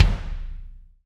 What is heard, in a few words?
BD, BD REAL